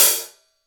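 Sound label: HI-HAT CENTER; Greytsounds; HI-HATS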